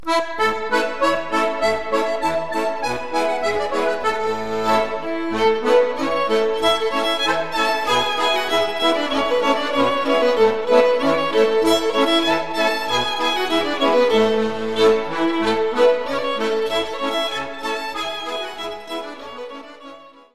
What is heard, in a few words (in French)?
Maclote de Stavelot, Belgique